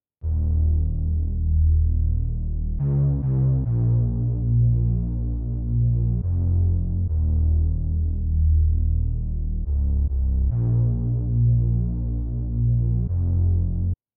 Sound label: 140 BPM D# Min Bass; Cymatics; Destiny Gold Expansion Stems; Hall Of Fame